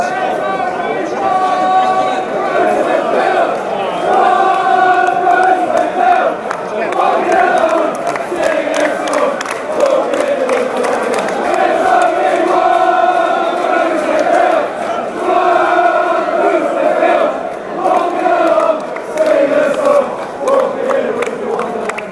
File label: fan chants; crawley